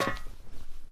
ladder, Footsteps; zps; Foley

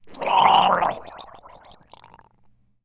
Death, Zombies; zps; Carrier

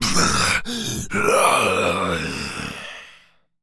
Berserk, Zombies; zps; Carrier

